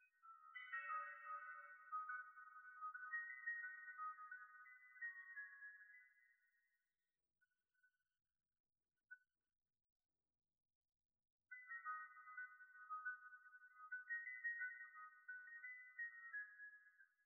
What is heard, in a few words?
high piano extras; stems